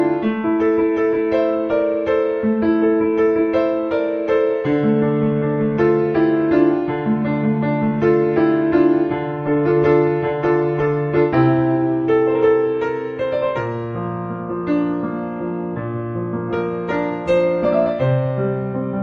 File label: Piano